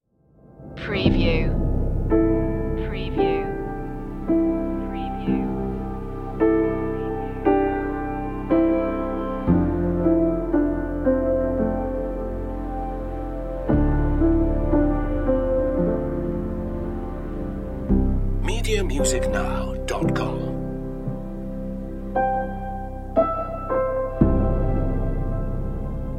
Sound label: piano drops